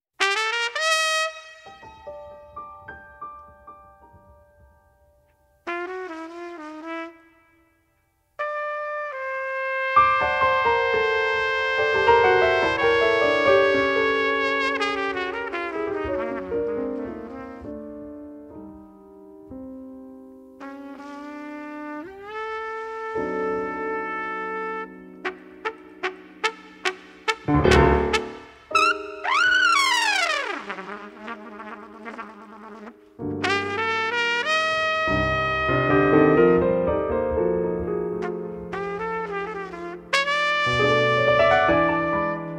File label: Trumpet